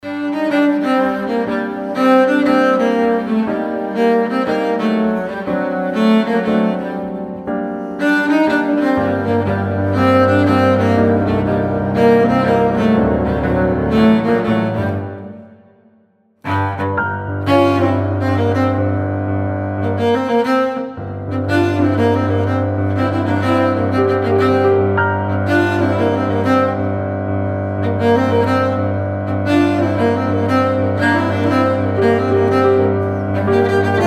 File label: cello and piano; виолончель